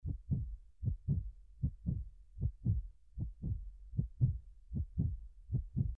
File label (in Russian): serdcebienie; Страшные звуки для монтажа